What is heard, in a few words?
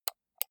computer mouse right click slow